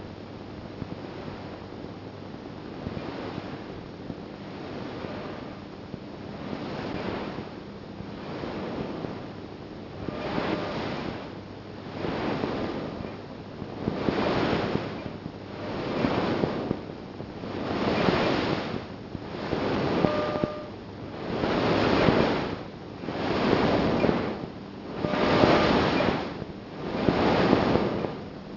Strange noise with voice

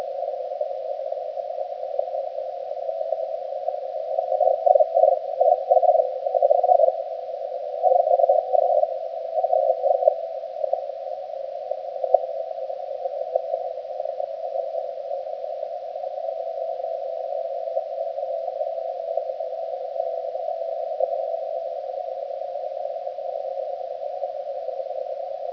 ulf experiment sound

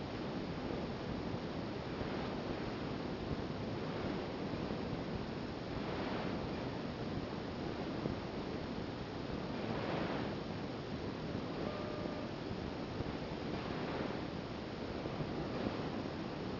Taurus A strange noise; priboy

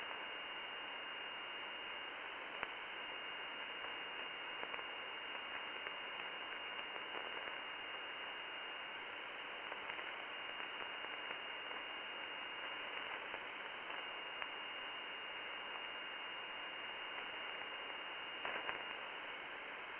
Mongolia 136kHz

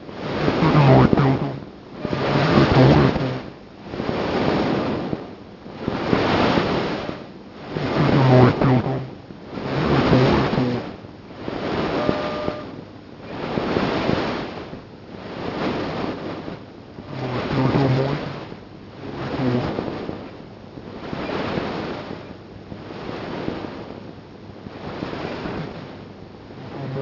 Strange voice

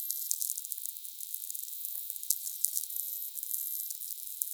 sferics, VLF

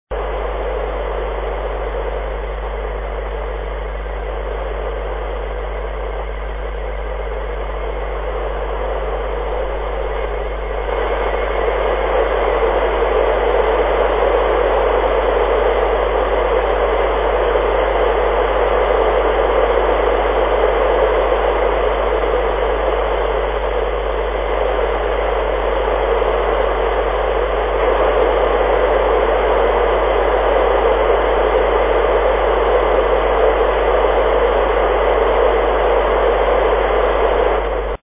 sstv; ARISSat1

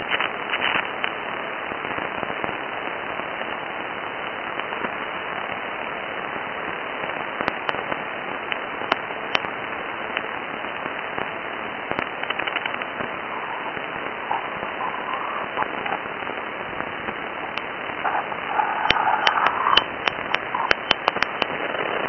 RTTY

